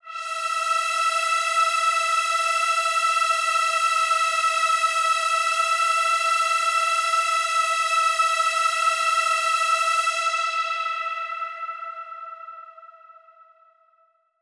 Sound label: Big Pad E4; KG MegaSaur